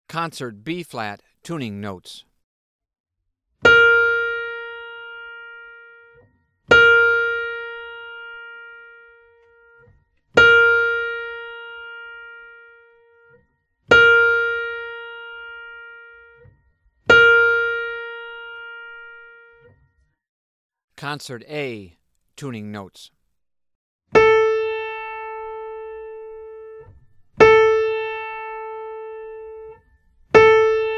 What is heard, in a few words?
Tuning Note; James „Jamey“ Aebersold Play-Alongs